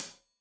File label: Hat Tight, Hi-Hat, Cymbals; Deeply Sampled Drums